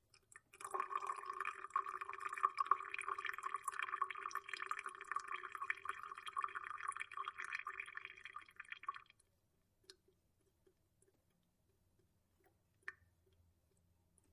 Faucet On Off Drips Si, drips

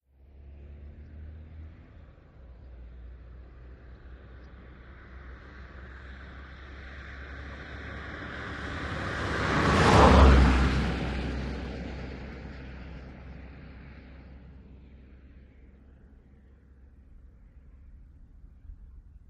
VEHICLES ASTON MONTEGO: EXT: Slow pass.

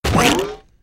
Cartoony Impacts Boing, boings

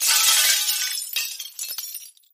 Glass Debris

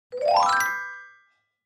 Clavichord Ascendin; keyboards

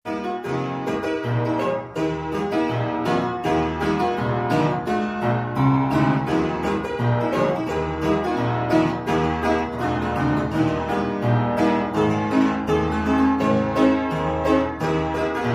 Piano Plays

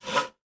handsaw stroke

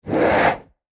Close Handheld Torch; torches

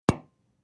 Camera Strobe Light

